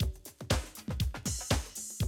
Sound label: 120, Classic Drum Loops